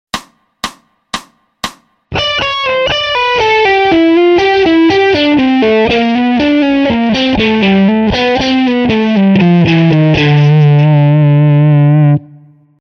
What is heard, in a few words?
Mixolydian Mayhem Lick